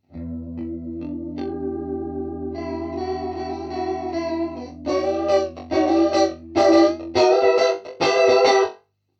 heavy chorus

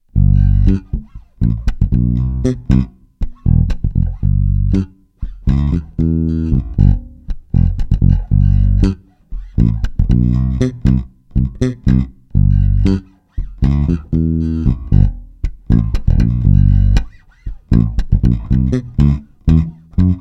FUNK BASS SPREAD

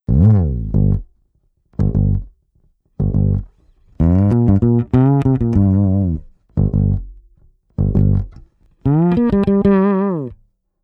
Bass Track Direct

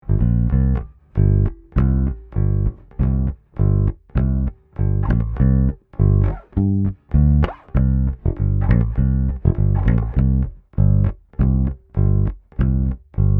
Cuckoo Bass Track

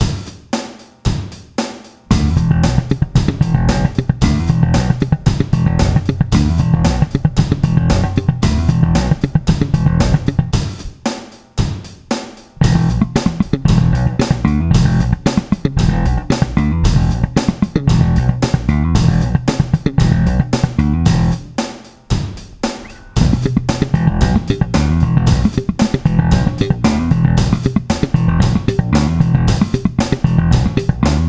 SLAP EXERCISE PARADIDDLE MODES FAST

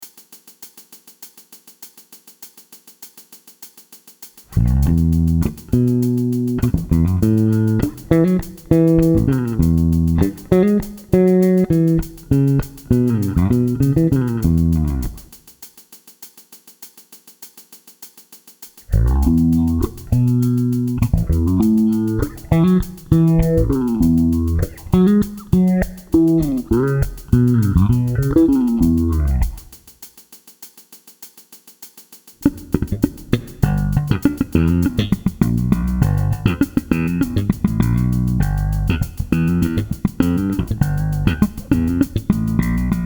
EBS DPHASER

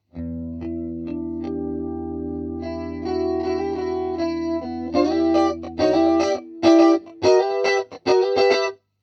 med chorus

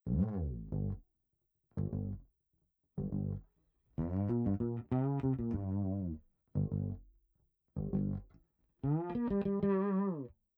bass track chorus

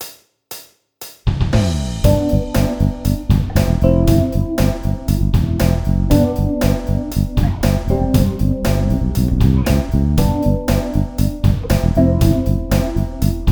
groove mix

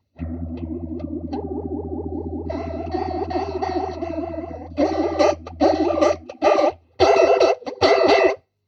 heavy flanger

